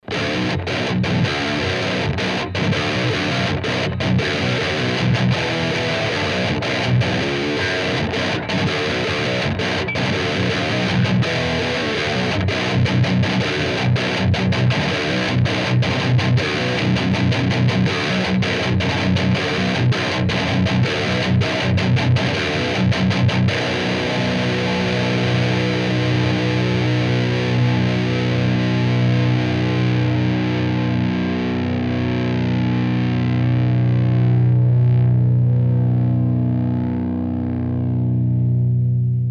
schecter przester, 7string

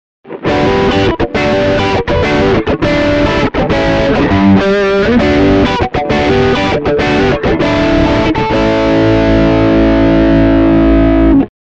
neck bridge, mp90

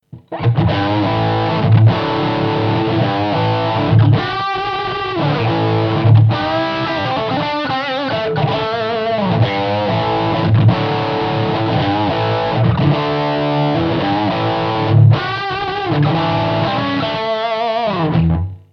bridge lead, mph

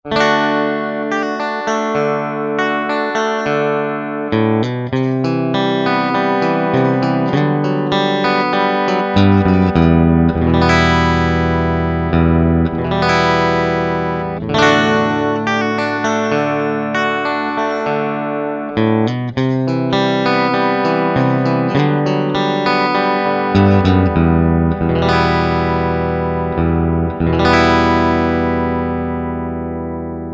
Bridge Single Coil

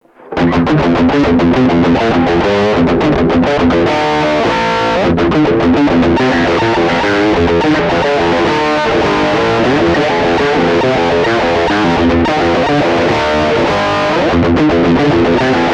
eclipse bridge drive; tech21

